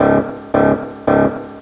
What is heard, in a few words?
alarm; quake; fortress